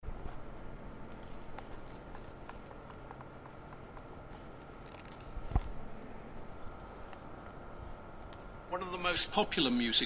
accordion

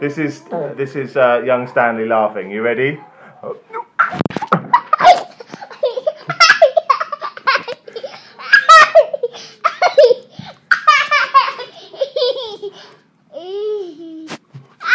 laughing

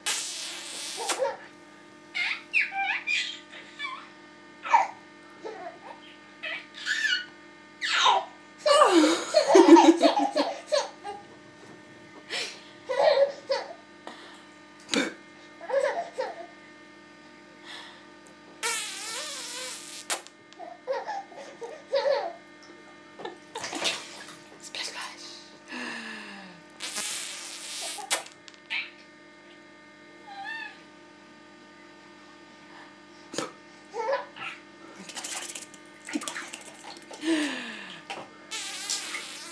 bath time giggles

